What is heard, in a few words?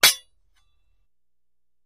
Axe Clinks, Dull; Single Medium Clink With Metal Scrape. - Metal Scrape